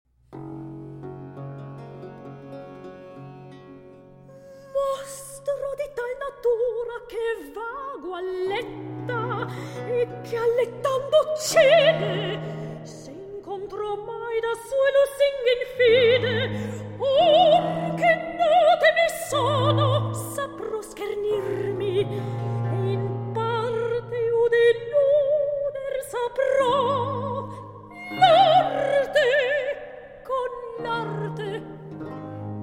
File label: Recitativo; Martesia